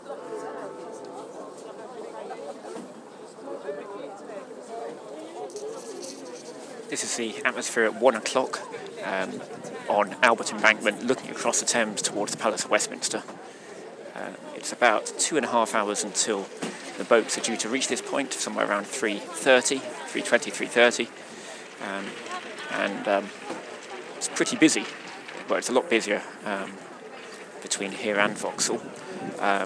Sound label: atmosphere before the boats